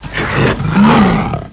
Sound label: Death